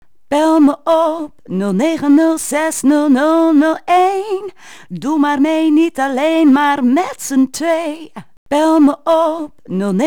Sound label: Jingle